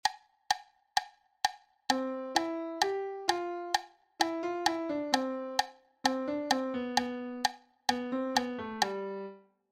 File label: syncopation